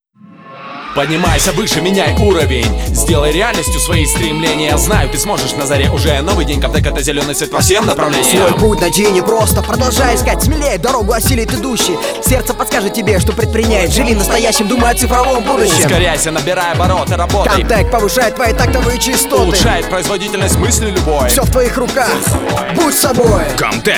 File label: rap